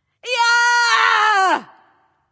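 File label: battlecry, female; smite; voicepack; hera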